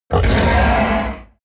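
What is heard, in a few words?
coloss shout loud deep, creatures; kreaturen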